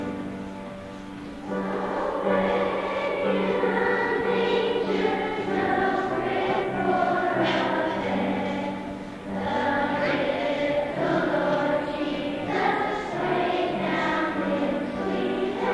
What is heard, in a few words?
Away in Manger piano